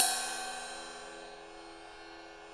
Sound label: Rock Ride up3; EMU PX-7